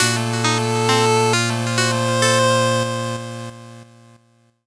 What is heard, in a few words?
8bit jingle slow